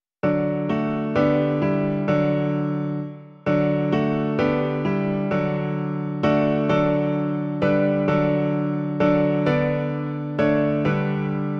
klavír; Když zřím ten divuplný kříž; Klavírní verze

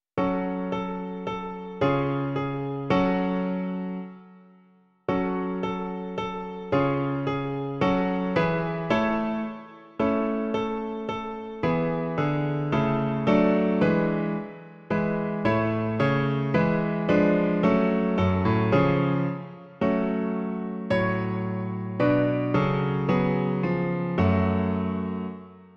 klavír, Klavírní verze

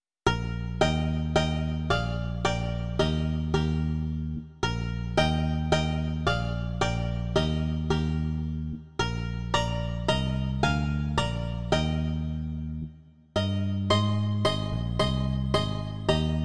banjo a baskytara; Jak rozkošné a milé; Imaginativní verze

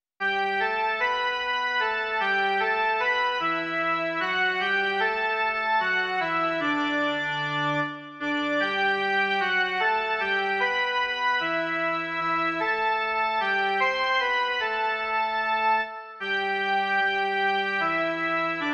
jen melodie; Jeden Pán, jedna víra

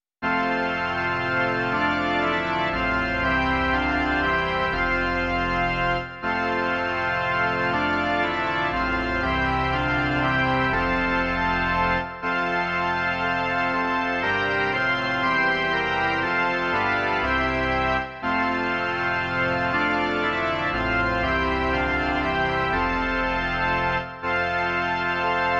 varhany

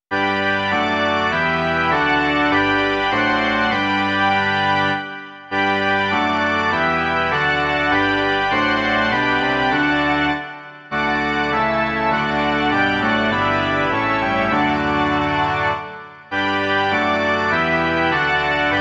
varhany; Kriste Králi, Dárci spásy

